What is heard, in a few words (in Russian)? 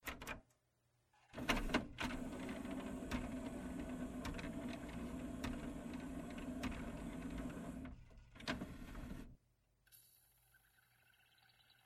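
zvuki cd-pleera, CD player sounds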